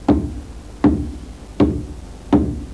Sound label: bass tone, congas; technique